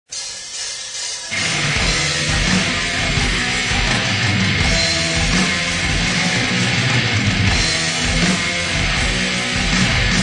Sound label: Viking Folk Metal